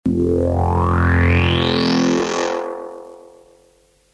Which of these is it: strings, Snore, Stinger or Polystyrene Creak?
Stinger